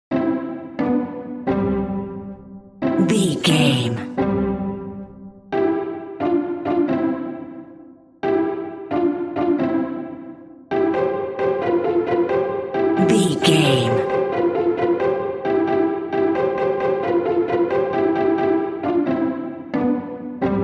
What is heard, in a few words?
three blind mice pizz strings, Three Blind Mice Pizzicato